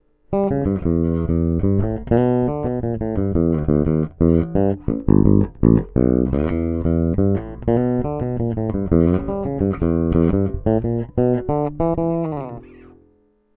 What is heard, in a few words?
PJ Bridge DI